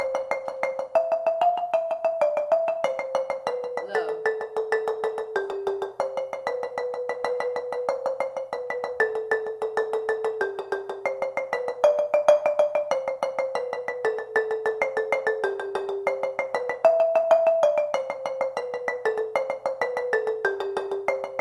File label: lead low rolling, marimba